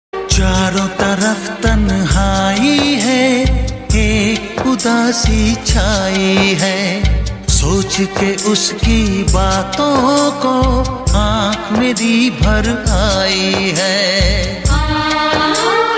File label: Heart Broken Hindi Sad Song Ringtone